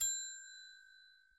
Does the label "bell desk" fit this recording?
Yes